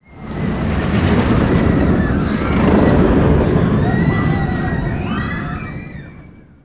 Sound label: coaster; Digital Sound Gallery - Volume 1; fun game